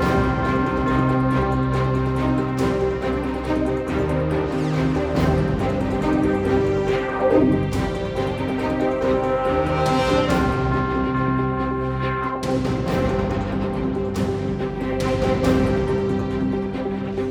Enemy Turn

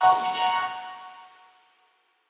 Quasar Clear B

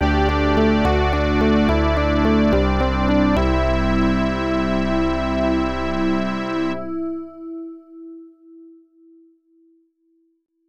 Item Get; lagoon x68000

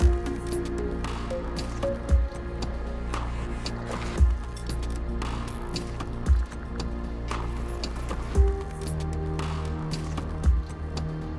Exploration 57 5BPM

